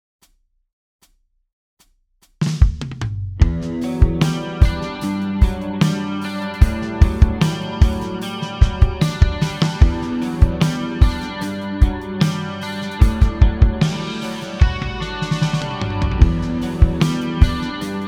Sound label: Ampeg Classic Rock